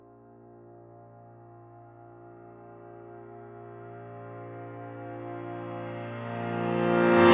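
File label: Piano In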